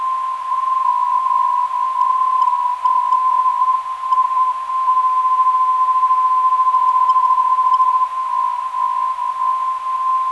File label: ZZ 379.03kHz, ndb